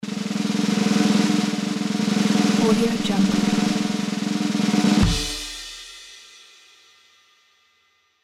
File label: drum roll win; fat-ostrich